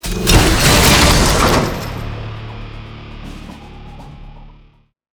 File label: Interface Item Reveal; Counter-Strike Global Offensive; PS3 Windows Xbox 360